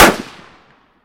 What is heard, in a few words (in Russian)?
probnij vistrel v tire